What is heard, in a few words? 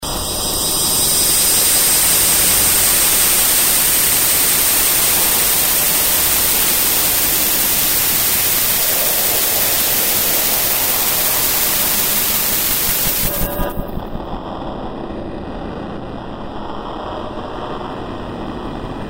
breathing